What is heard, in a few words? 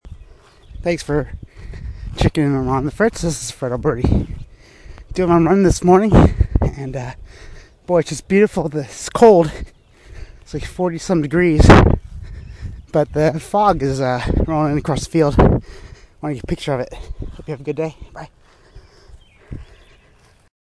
morning run